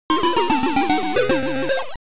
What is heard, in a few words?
Bank Loss; wario land